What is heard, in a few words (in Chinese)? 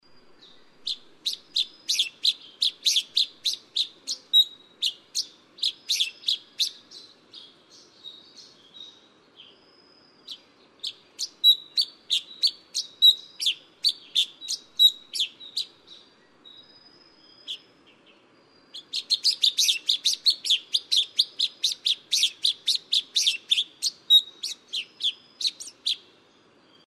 紅嘴黑鵯; 鵯科/留鳥